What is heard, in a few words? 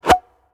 Ui Gen Popup Enter; Angry Birds Epic